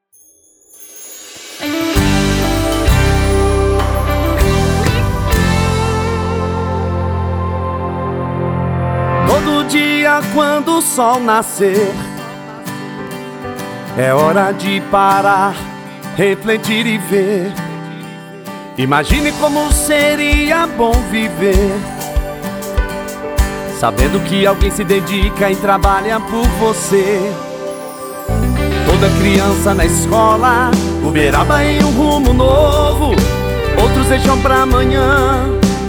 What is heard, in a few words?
JINGLE